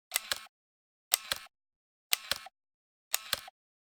Generic Click Metal Small

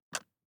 DICE on Glass, Grab, Standard, 4 Four Dice; Samples; ArticulatedSounds; DICE Sfx Party (Extended)

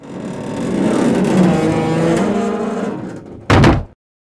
bridge moving; firefight

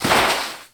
Baseball Slide Dirt

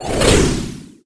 Scifi Synth Tone Switch On; Energy & Forcefields

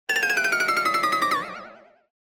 Comedy Piano Chromatic Down - Fast Descent; Hollywood Edge; sound designer's toolkit v2

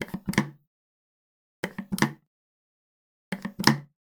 pouring soap into hands; human; NS Studios - ultra sound collection

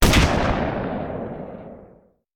Rifle; Sound Ideas; Guns

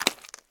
mud; firefight

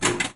Pinball Target Pop Up

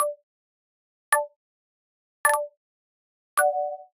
Digital Click Neutral

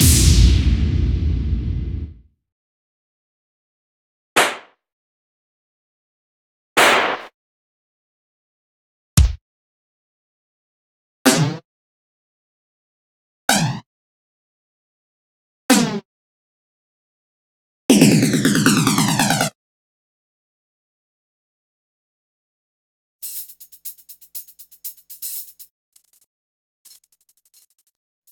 pump; best service Blue Box; percussive fx

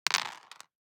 DICE on Cardboard, Throw and Roll, Mini, Six Dice; Samples; ArticulatedSounds; DICE Sfx Party (Extended)

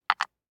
DICE on Wooden Tray, Drop, Standard, 3 Three Dice; Samples; ArticulatedSounds; DICE Sfx Party (Extended)

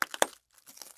glass; Pitch Black - A Detective's Demise

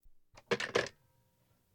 Household Phones; Valentino Production Sound Effects Library CD50

